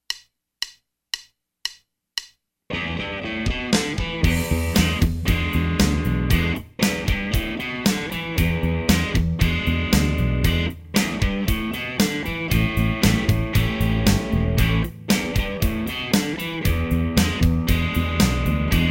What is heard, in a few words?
Texas Rock